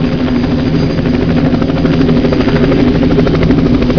HELICOP; squirrel

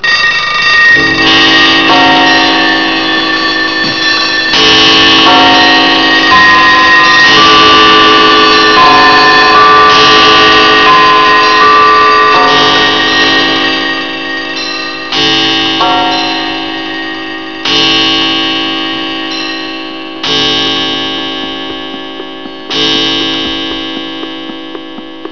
CHIMES

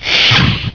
Open

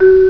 TONE

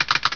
key click

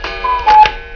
Cuckoo

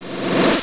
FX WARP

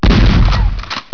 SHOT; DOOM

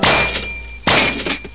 money

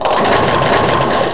BOWL

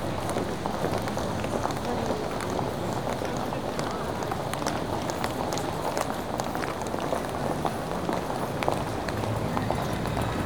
CROWD

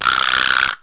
CART SHAK; toon

